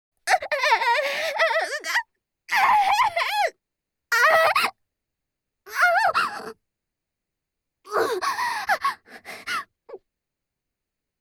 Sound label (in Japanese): 絶頂（SEなし）高潮